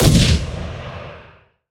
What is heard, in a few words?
gun; Tailgunner